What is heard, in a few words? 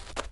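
shuffle, footsteps hard surface jump up; grunt footsteps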